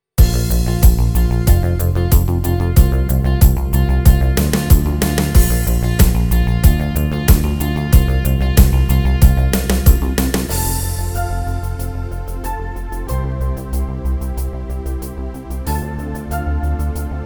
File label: Pop